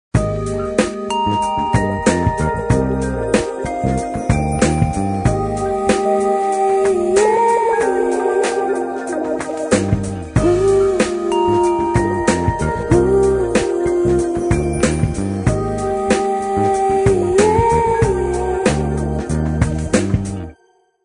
Original Ringtones Nokia 7370

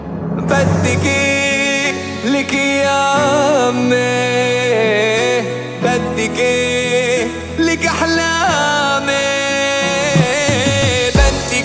Arabic Song Ringtone

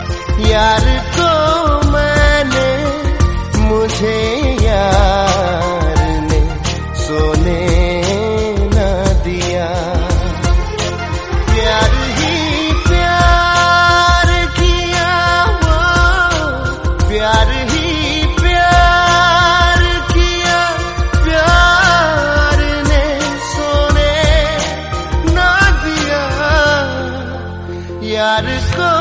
Arabic Ringtones

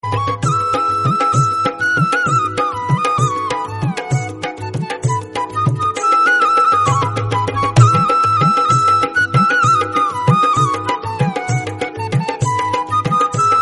bengal flute ringtone; Bengali; Ringtones

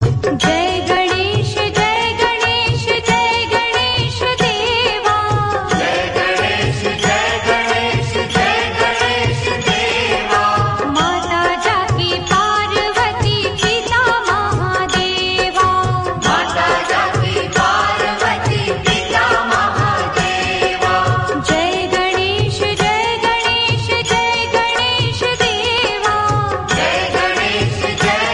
Lord Ganesh Aarti; Devotional Ringtones

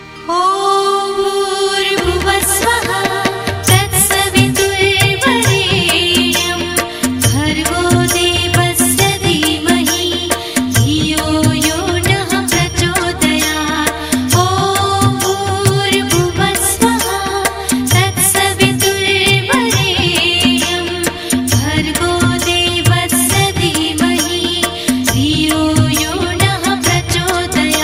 Gayatri Mantra, Devotional Female Voice; Religious; Ringtones

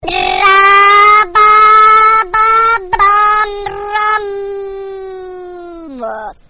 Taking Off 2 Stroke; Ringtones

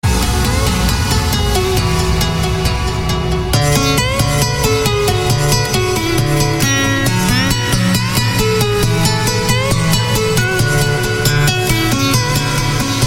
State Of Trance

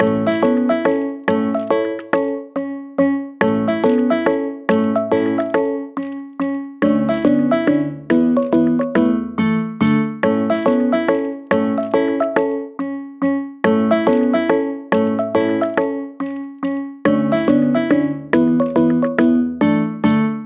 pyxis, ringtones, OnePlus 6 Ringtones

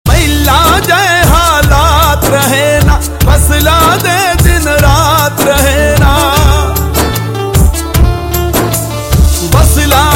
Punjabi Sad Tones; Ringtones

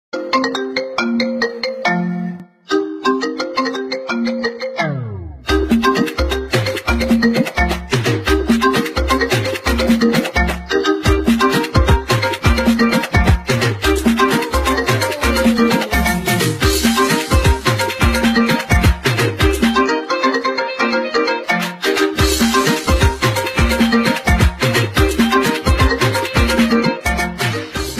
iPhone X Max Ringtone

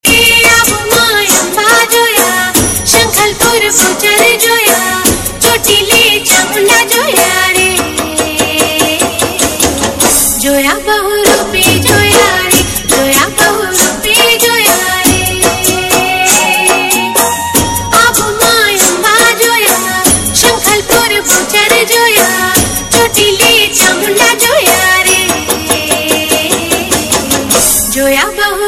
durga maa gujrati; Navratri Special

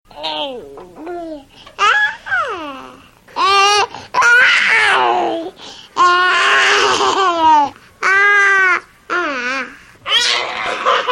Baby Happy; Very Funny Ringtones

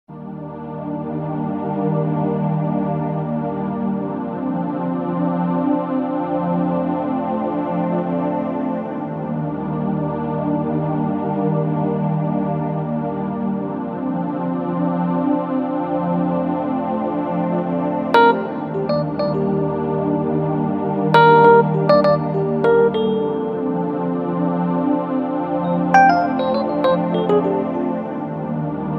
Ambient Chillout; Ringtones

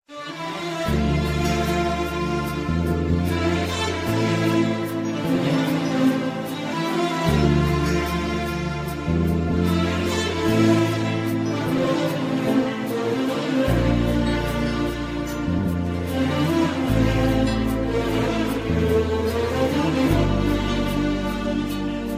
arabic sad music ringtone; Arabic-Ringtones

